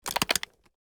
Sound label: draw; tacz; daffas arsenal